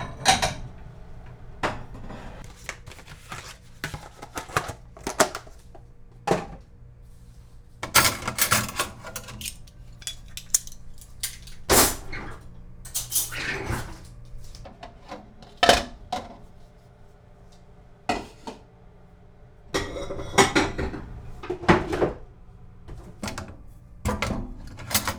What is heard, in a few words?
Putting Away Dishes, kitchen